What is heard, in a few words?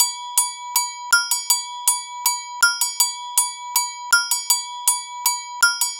Agogo Bells; Sonic Foundry (Sony Creative Software); Joe Vitale Latin Percussion